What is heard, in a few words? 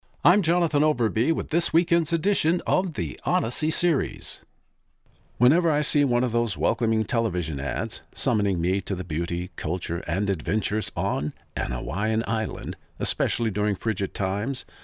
slack key guitar